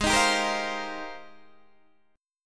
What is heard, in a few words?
G sharp Major 13th, Guitar, DADGAD